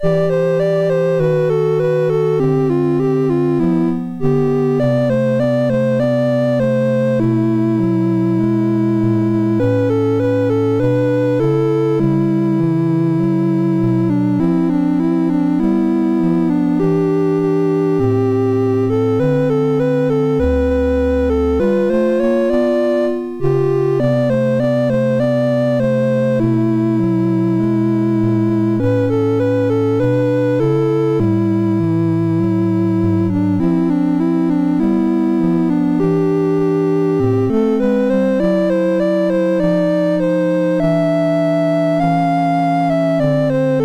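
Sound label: MOS 6581 SID chip; MOS6581R3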